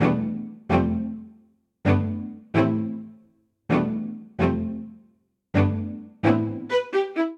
Phrase Purposeful v VI VII I A Minor 130 bpm, String Quartet; Ableton; Factory Packs; String Quartet by Spitfire Audio